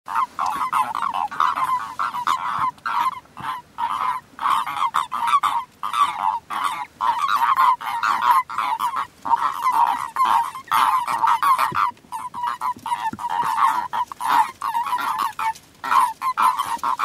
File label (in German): Audio Erdkroeten